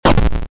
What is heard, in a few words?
break; Mindustry